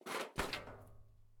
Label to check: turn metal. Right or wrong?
Right